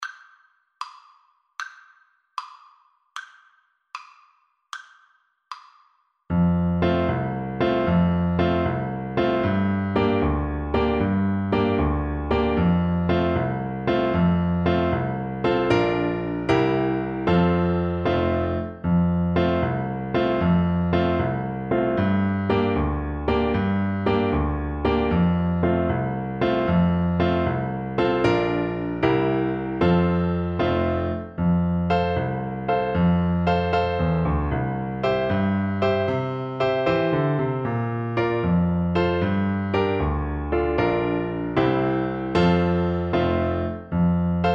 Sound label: irish washerwoman, Tuba